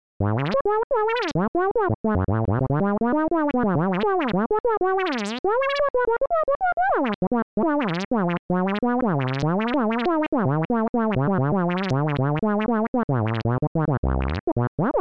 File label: talking synth; Learn to Code From Zero With Godot; DialogueBoxes